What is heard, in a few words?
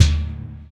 METAL; ILIO - Ethno Techno